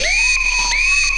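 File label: scream fx left; Big Beat Samples & More; AKAI; SHORTFX KIT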